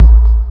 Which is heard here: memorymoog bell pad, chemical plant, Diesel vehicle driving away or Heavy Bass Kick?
Heavy Bass Kick